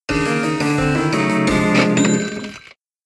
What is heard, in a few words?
Mission Failed; Dillon's Rolling Western; Nintendo 3DS